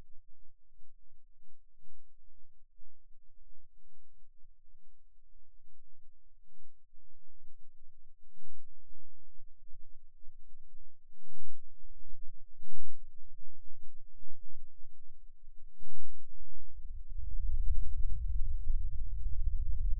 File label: Rhythm Seizure, eeg